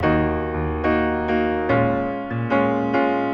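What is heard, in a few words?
piano, 451 XY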